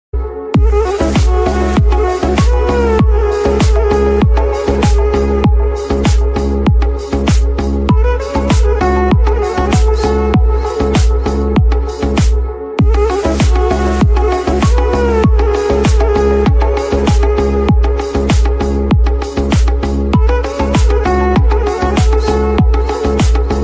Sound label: turkish love music incoming call tone